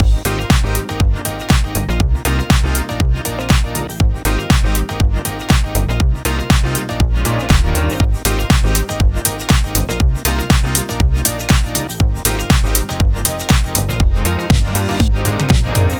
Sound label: filterstep